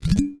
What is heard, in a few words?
ping